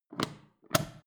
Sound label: foot switch turn on off depress full